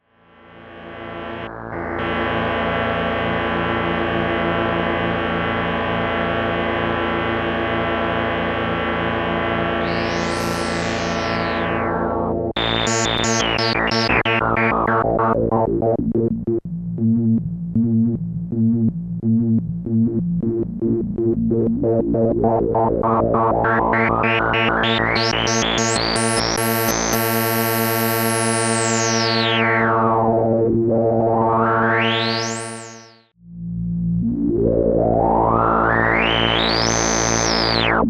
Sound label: SM100 Filter Tweaks, Solton SM100 Synths